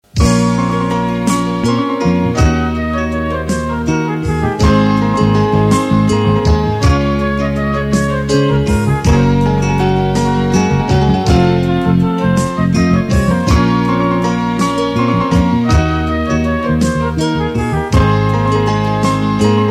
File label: Guitar